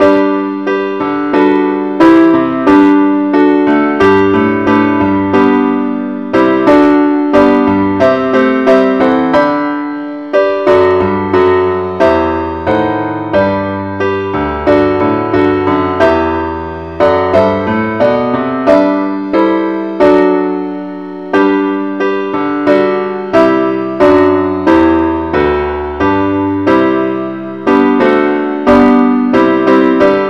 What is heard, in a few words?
keyboard only, key G; christe Sanctorum